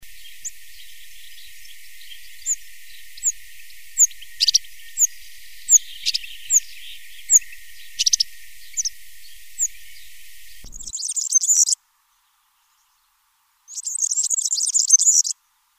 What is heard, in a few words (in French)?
Serin cini